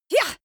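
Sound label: female combat attack short, screams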